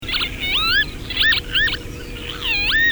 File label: Vanellus vanellus